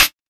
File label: Clear Hat Sample E Key, Royality free hat sound tuned to the E note. Loudest frequency: 4671H